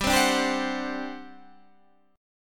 G Major 7th Suspended 4th, G, down, Guitar, Standard; GM7sus4 strummed; JGuitar